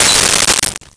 Glass Break

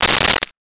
Keys dropping on the Table, Foley

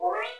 window

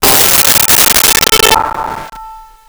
Metal Gong

